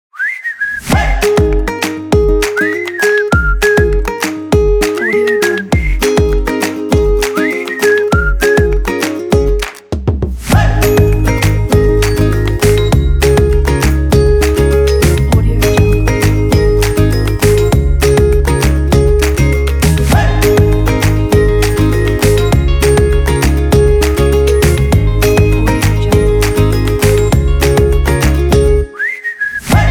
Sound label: happy; rainbowsounds